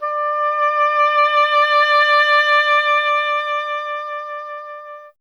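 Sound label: oboe, wind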